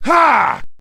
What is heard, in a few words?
man grunt; Mount&Blade Warband